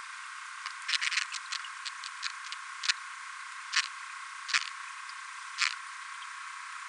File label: Opsodoras stuebelii; Amazon